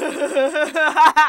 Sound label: Laugh